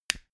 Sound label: stick lighter light up flame